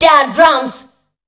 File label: PHRASE, Voice Spectral